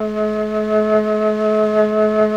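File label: Mellotron flute 1A2; Sonic Reality; Vintage Timetraveler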